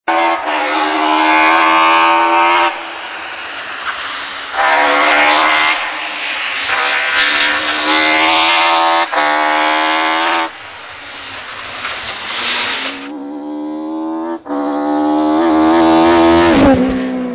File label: Bmw M3 E30